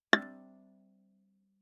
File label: Viola pizz ff sul A A6; MIS Pitches - 2014; Strings